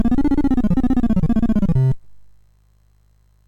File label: MOS6581R2, GAMES; Sammy Lightfoot